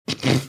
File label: snorting